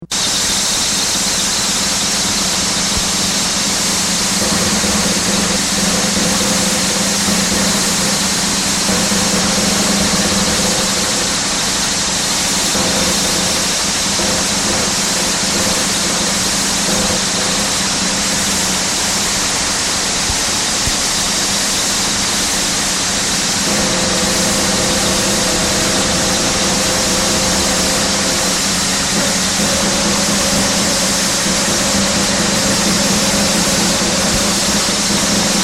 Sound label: snare and the night s quiet